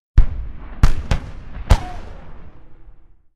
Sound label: Ambience Gun Shot